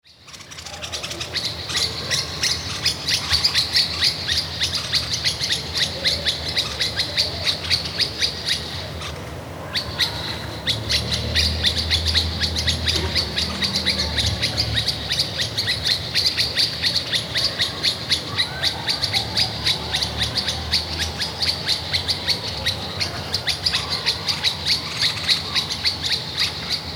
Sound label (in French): tiznit kasbah oiseaux; resonances oasiennes